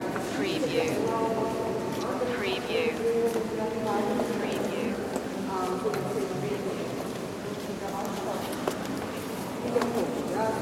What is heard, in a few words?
kremlin church ambience